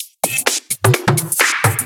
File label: House loops 128BPM; Vengeance Samples